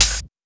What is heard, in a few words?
Hi Hat, drums; Sonic Foundry (Sony Creative Software); Drums & Drones; Construction Kits; Skip Kit